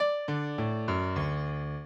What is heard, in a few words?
minuet, mozart